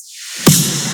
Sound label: Snare